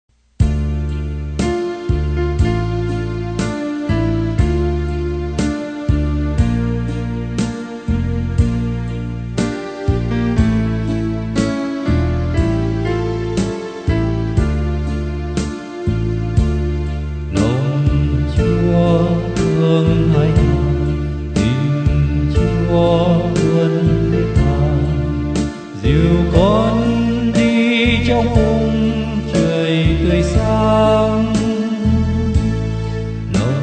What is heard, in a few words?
bass